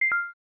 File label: cam stop; LG; X power 2